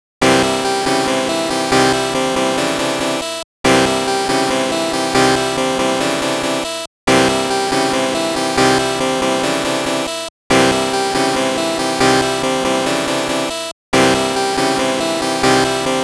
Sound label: Beat